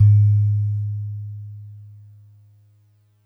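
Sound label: DreamLike DX100 G sharp 4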